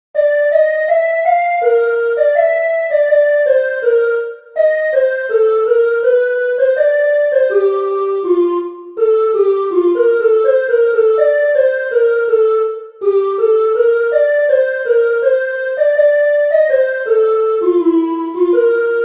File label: acapella